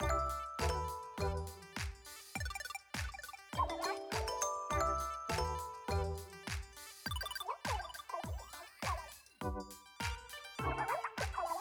BGM Plaza Gear Shop

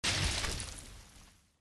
Building Destroyed

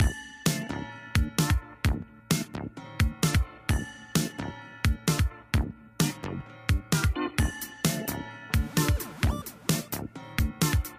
Music Dungeon; Adventure Time Game Wizard Draw Your Own Adventure

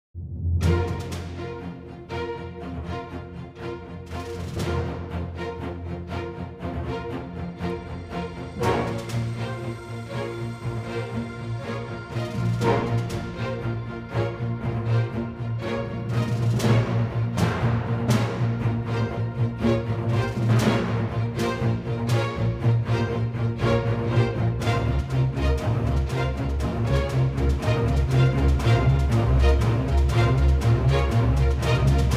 Tactical Ambience; Master of Orion

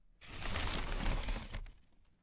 foley pick up

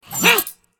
Character Golden Attack; Angry Birds Epic

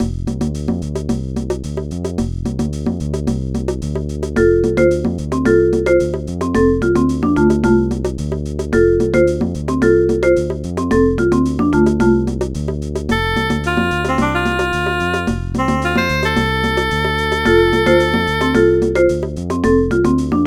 Jungle; super mario maker 2; SMW

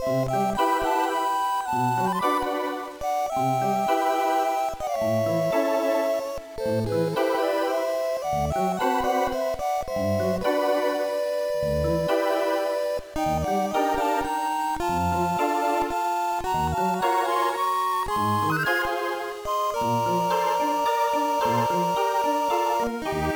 BGM; Kawa no Nushi Tsuri 5 Fushigi no Mori kara; gba

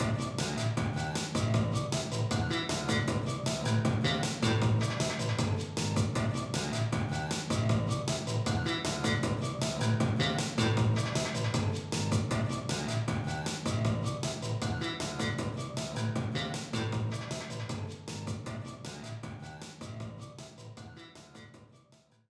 BGM Mission Stage Crater Leak

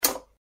Tick

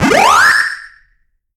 Ghost Eat; Pac-Man Championship Edition 2 Plus

